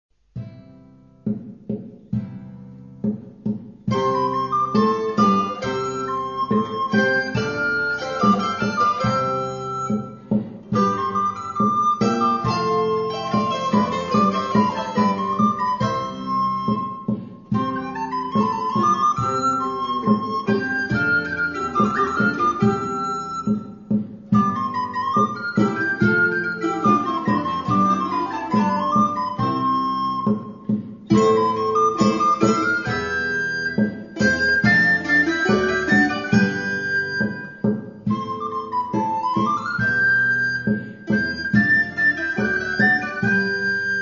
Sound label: Basse Dance; Basse Dance Mon Desir